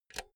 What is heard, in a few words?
insert cinch